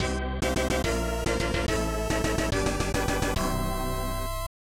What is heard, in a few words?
Unused Jingle; Gaia Savior; Hero Saidai no Sakusen; SNES